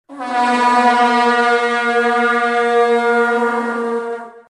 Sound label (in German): Vuvuzela Stadion